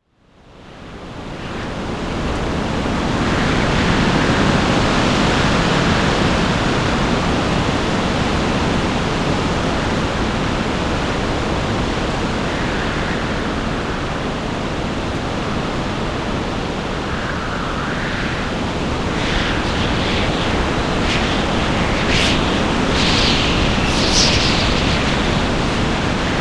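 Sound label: storm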